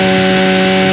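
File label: Engines low loop; SuperBike2001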